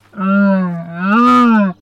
Camel Moo Moan; camels

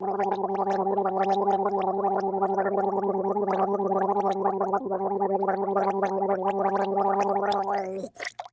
Gargling

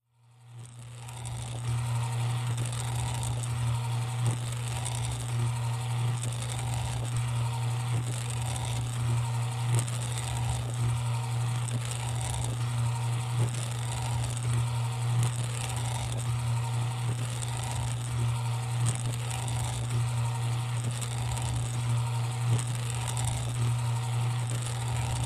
Small Electric Motor

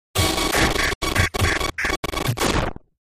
Static Chopped, static

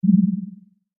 interface button, clicks

